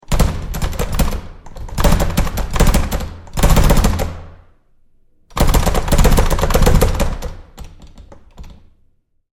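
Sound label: Door Bathroom Stall Handling Close Up Rough; Big Room Sound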